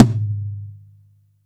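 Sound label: TOM loud; Zero-G; Chemical Beats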